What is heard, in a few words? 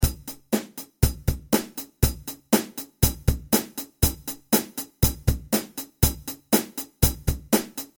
drum